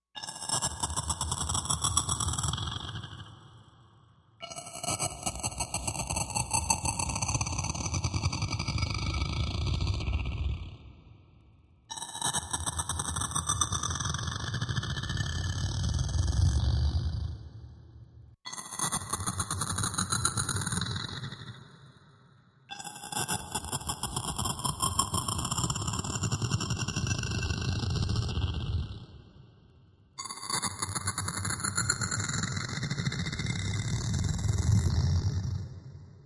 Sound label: Grim Scraper Machine S, eerie ambience